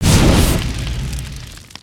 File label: Hot Dog Flame